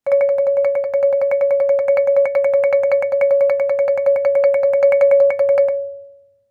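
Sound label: Marimba roll fortissimo Db5; MIS Pitches - 2014; Percussion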